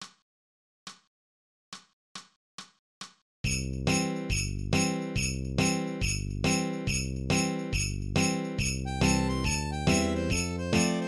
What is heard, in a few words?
Jingle Bells